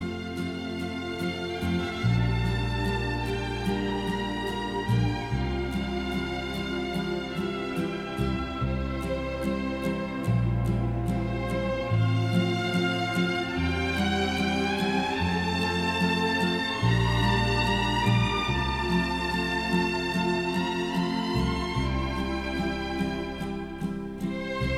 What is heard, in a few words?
Romance f violin a orch in f m